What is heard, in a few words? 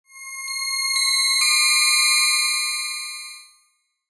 Logo Swell Synth Piano; Sonic Logo; Blastwave